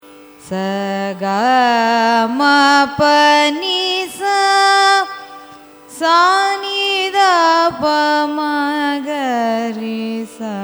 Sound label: raga madhuvanti arohanam avarohanam; carnatic music